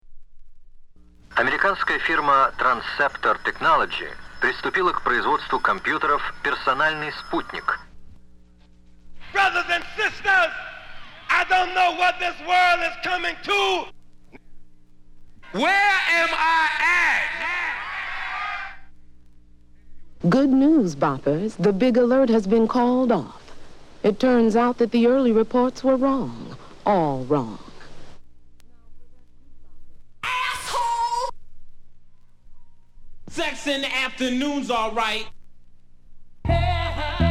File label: samples scratches